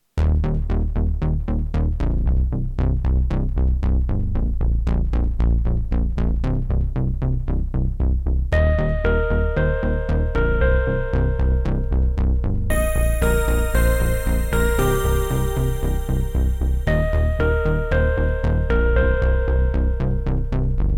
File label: Digital garden happy music